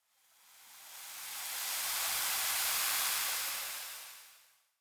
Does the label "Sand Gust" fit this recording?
Yes